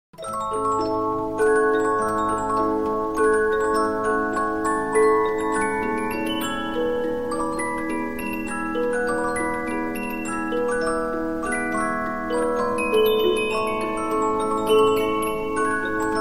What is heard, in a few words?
Polyphon, 15inch disc musicbox